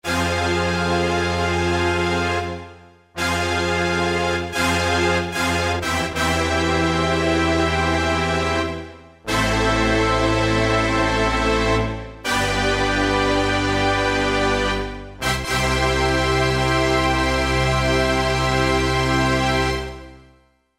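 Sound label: Brass + Strings, Roland U-110; Factory Patch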